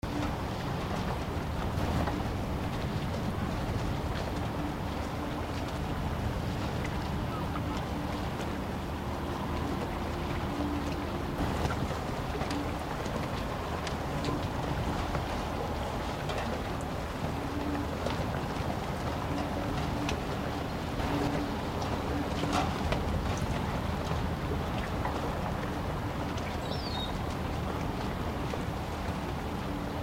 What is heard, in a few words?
harbor docks